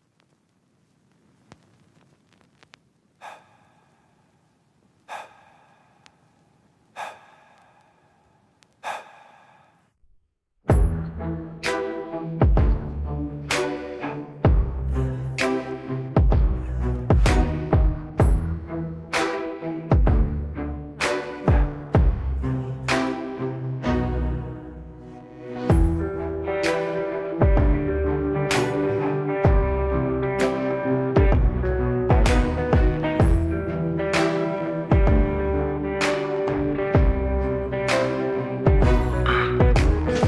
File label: instrumental